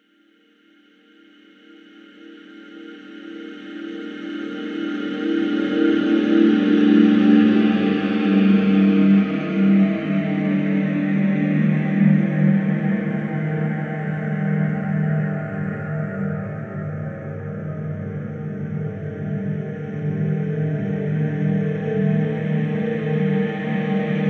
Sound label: large drone; loopzone2